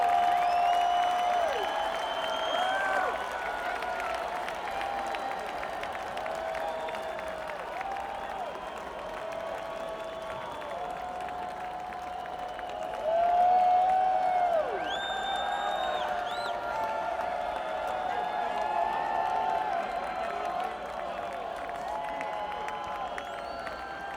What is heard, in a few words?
Crowd; Manchester, TN; Bonnaroo Music Festival